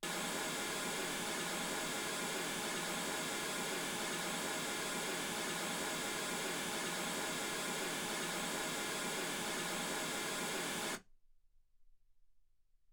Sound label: mls16 48kHz